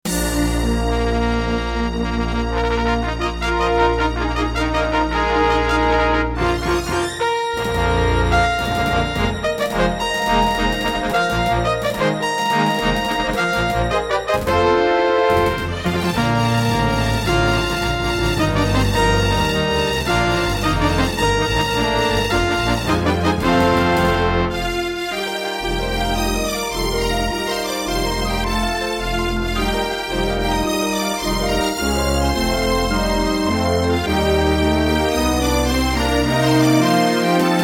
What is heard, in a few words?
Intro, Roland RAP-10; Dark Forces